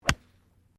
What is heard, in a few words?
GOLF DRIVE IRON; Various Golf sounds; Sports